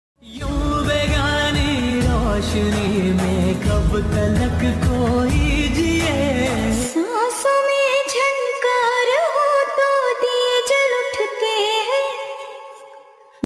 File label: song slowed reverb